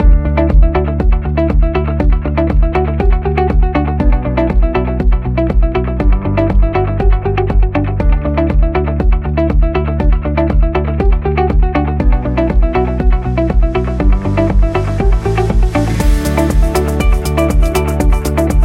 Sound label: Corporate music